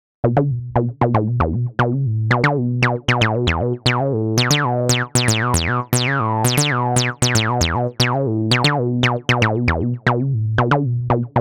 Flowtones; Toneboosters

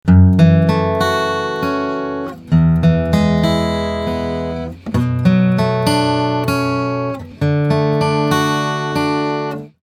Guitar Dry

